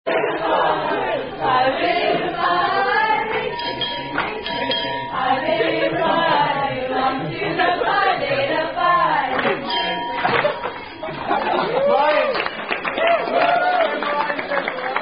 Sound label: Ring Ching Ching Pi Beta Phi Sorority Song